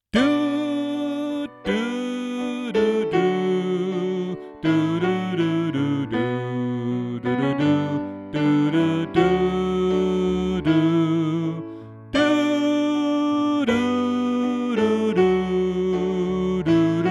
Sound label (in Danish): Sopran; oevefiler